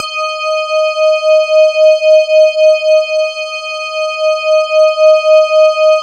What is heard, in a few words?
E-HARP, DX HARP; Wizoo - Powered DX